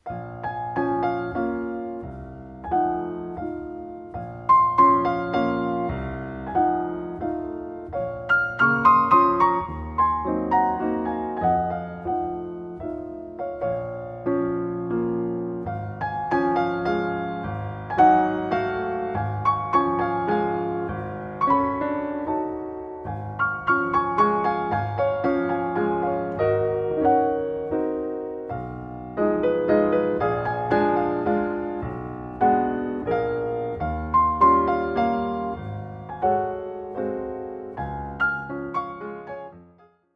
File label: valse